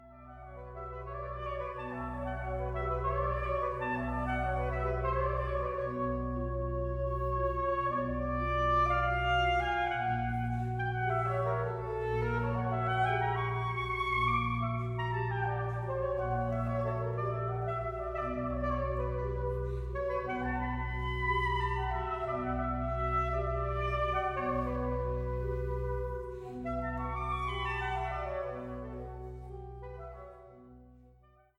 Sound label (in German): Sax Orgel; Friedenskirche